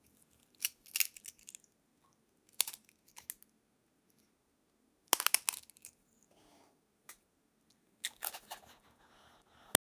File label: a simple egg crack, crack